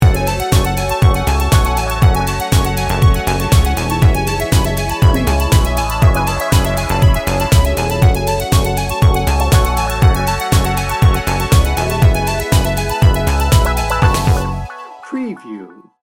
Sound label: moody dancer